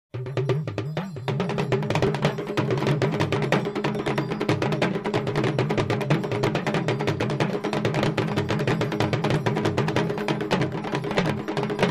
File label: Dundun ensemble of Adjarra Benin; Jagun Jagun